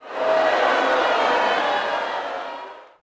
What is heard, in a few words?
Boo, Crowd, reactions